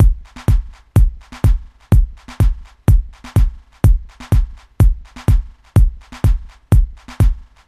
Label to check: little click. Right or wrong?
Wrong